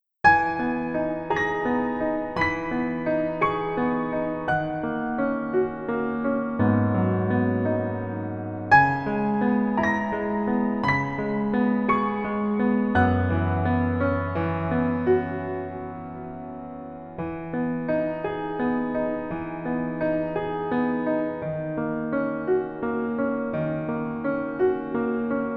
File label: Piano ver